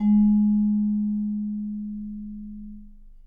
G#3, Percussion & Harp; Miroslav Vitous

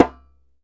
DJEMBE, African Perc; E-MU Producer Series Vol. 7 – Old World Instruments

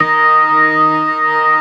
STOPS, ORGAN; Keith Emerson Signature Series

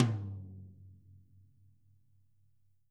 T12 F R, TOMS; Living Drums; Sampleheads; Peter Erskine; PB KICK+TOMS

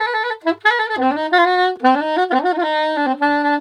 SAX, Balkanish Traditions

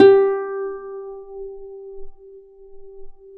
G3 HARP; USB Soundscan vol.62; AKAI

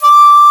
Shakuhachi D5; Multisamples; Planet Earth; Digital Sound Factory Planet Earth

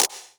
Percs, Elektron MachineDrum; SPS1 MKII

